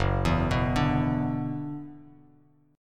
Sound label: F sharp 7th Suspended 4th Sharp 5th, Bass Standard; arpeggiated; 2,7,9,7